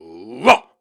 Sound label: Grunts and Chants, Viking Vocal